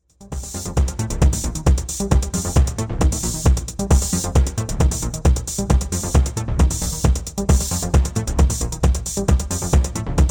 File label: Drone